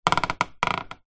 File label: household furniture old wooden creak